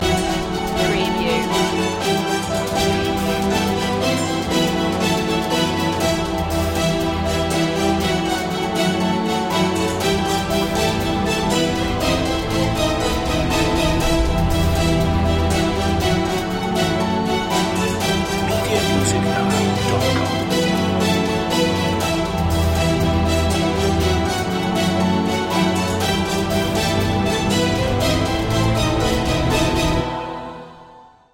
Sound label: action movie trailer